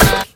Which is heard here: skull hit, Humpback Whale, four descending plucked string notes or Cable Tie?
skull hit